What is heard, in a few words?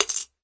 get artefact money; Blackeye